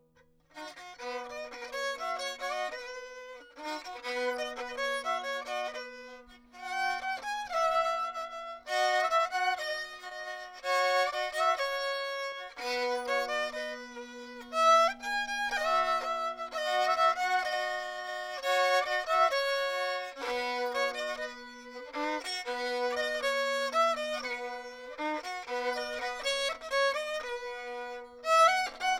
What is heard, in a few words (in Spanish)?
Ambiental, musica violin